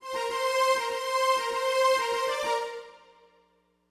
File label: Smooth Groove Strings, 70s Analog Funk